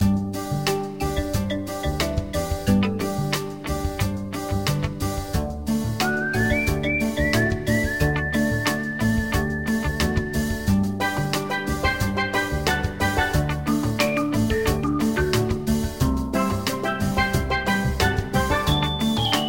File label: music; Rescue Team 3